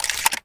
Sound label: shotgun insert; gmod; arccw uc commons attachments